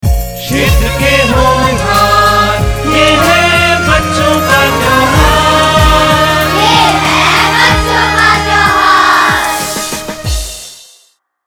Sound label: Jingle; Prayatna